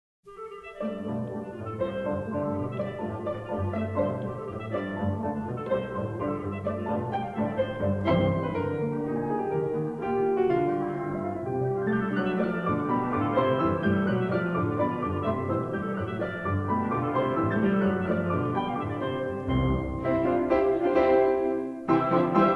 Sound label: polka; d.mijo